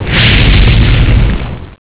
geysir

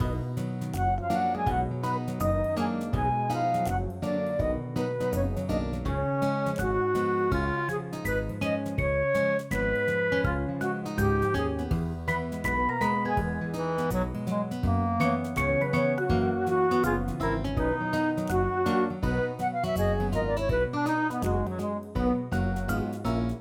MUSIK; MOBICLIC

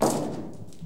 marmor

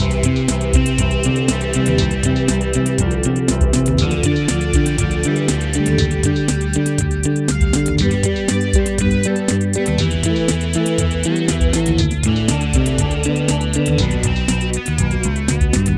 Musik; MOBICLIC 14

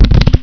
karten; winpolis

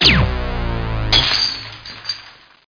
glas fx, klang

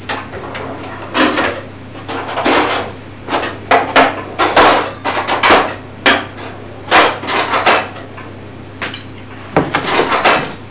klimper; 4,000 Icons with Icon Wizard

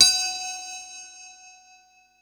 schlittschuh

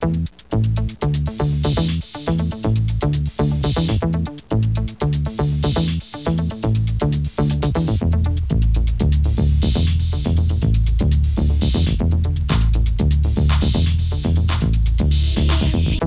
A musik; juicytown

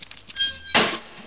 Maus

